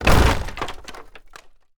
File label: wood break